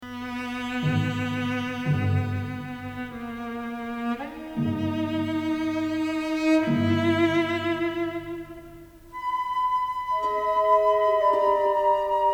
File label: cello concerto